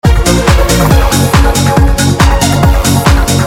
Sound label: Loop